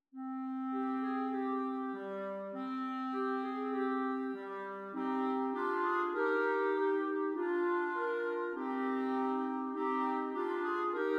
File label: clarinet trio